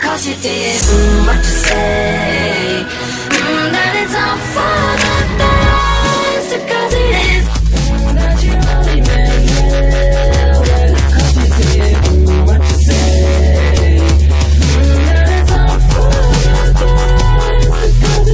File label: dubstep remix